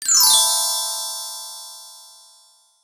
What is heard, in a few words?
pickup perl; diver jimmy